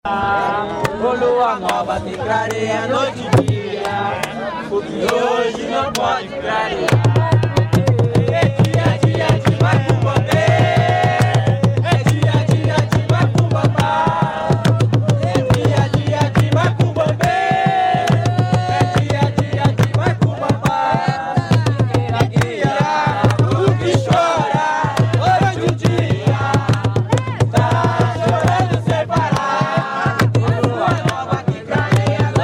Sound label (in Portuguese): jongo de guaratingueta; estrela guia